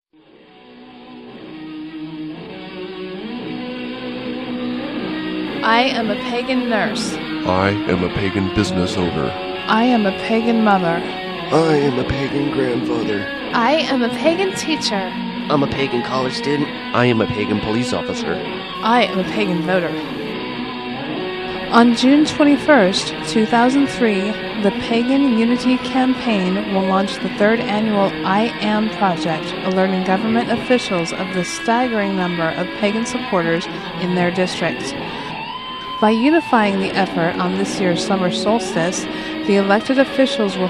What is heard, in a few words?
rock w female vox